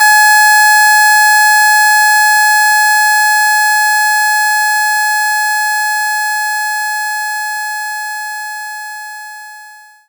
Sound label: Harpsichord A3